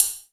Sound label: HHC VRB, Yamaha RY-10, Drums